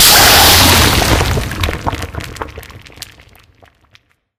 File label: object dissolve in goo; Source Engine; Portal 2